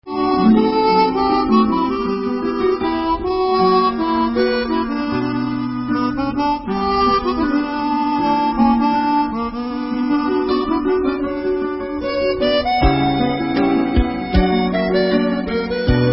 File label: Instrumental